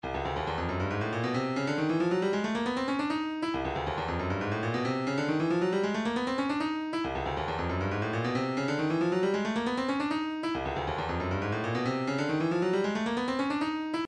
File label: sad sweep, midi